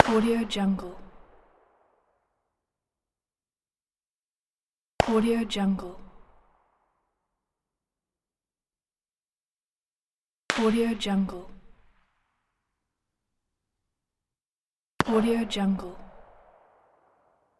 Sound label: single shot in the forest